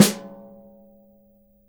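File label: JAZZ KIT snare drum, Drums Acoustic; USB Soundscan vol.10; AKAI; JAZZKIT 2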